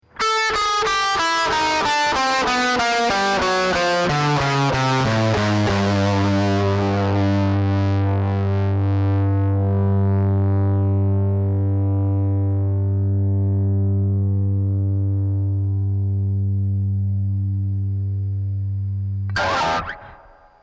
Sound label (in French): disto gamme; gamme son disto depuis La 440Hz